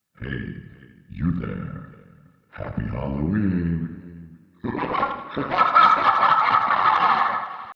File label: mirror effect